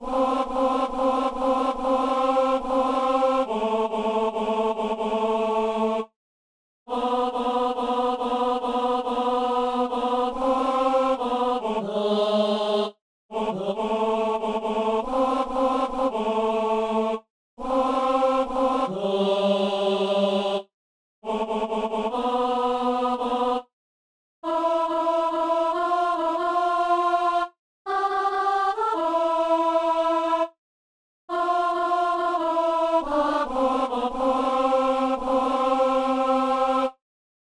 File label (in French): pain pour l homme, alto; Messe du 17 janvier à Saint-Denis